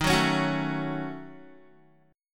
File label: Eb Minor 7th Flat 5th, Guitar; Ebm7b5 strummed